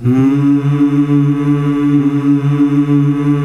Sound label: MMMMH D, male choir; Best Service; Hyper Dance